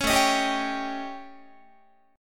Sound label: A 7th Flat 5th A down Guitar 4A,4E,5A,5D,5G sharp,6C sharp; A7b5; strummed